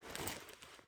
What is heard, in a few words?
generic cloth movement, weapons handling